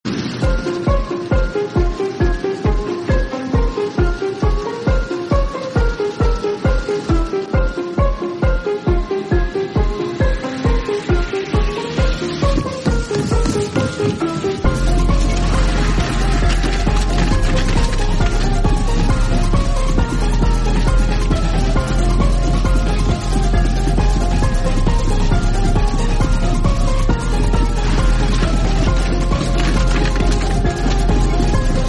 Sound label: soundtrack bgm remix; roblox doors seek